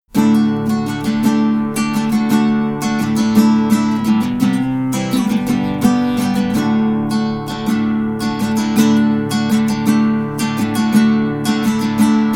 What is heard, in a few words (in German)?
Nylongitarre mit Mojave Ma-200